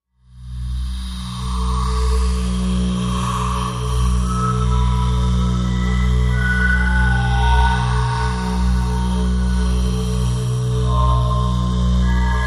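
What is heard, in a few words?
Low Breath, energy beams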